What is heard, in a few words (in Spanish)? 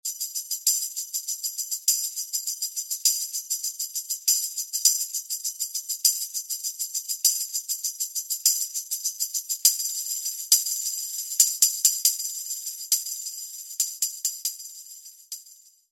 sonajas